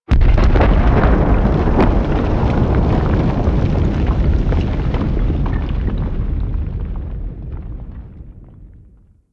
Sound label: Coolant Tower Rumble A; Warframe; Fortuna; Venus